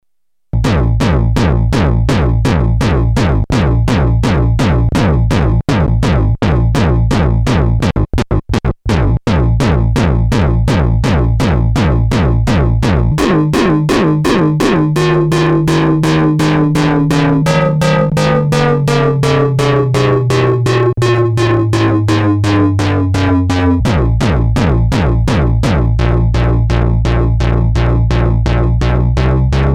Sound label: synth agressive mod, Moog Minitaur; aggressive modulation; analog monophonic synthesizer; 2 VCO oscillator